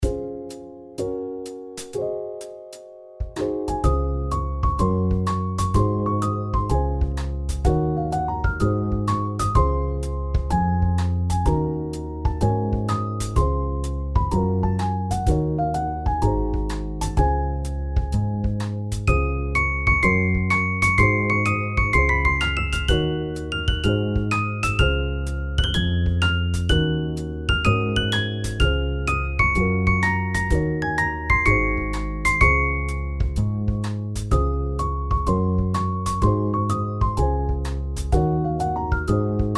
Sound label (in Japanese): かわいいシンセの音; chill